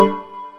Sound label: windows 98 error